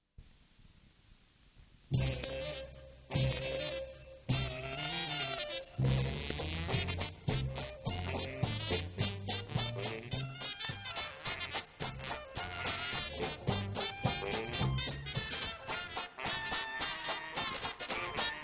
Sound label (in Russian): zvuki djaza; моно; Запись 1984 год